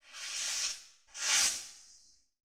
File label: Zing; Title Sequence Effects; Sony Pictures Sound Effects Series